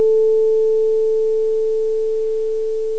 tuning Fork

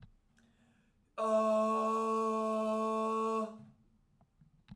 A3 chest, Chest voice

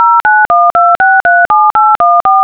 dtmf

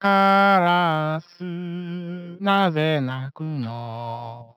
synthesized singing male; Eurospeech 2007

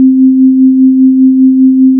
play Sine Wave

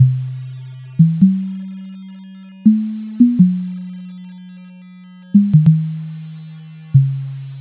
note From Pv

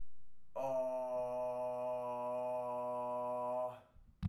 B2 chest, Chest voice

